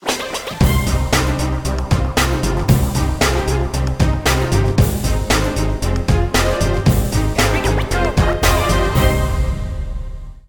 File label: loop ultimate goal; Garfield dmx minigame; Zen Pinball Party